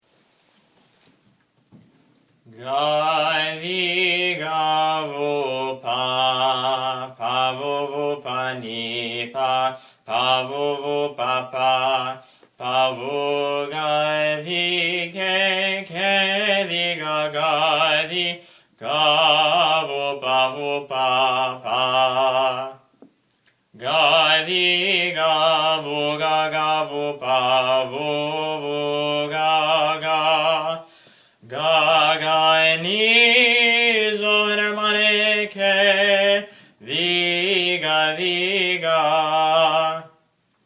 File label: Let my prayer - Tone 1 Slow, byzantine chant